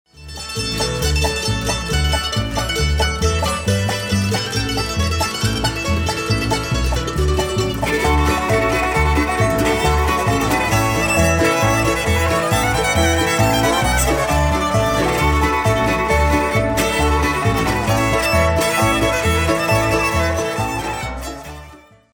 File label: Ragtime